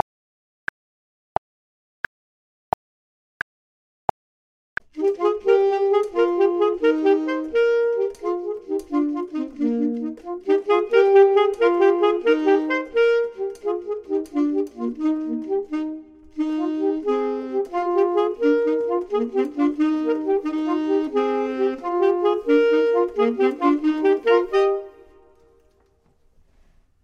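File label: both, Saxophone and Clarinet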